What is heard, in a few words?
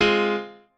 piano